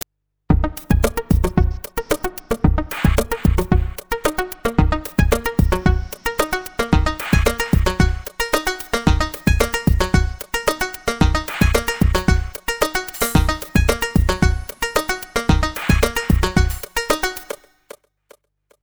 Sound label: electro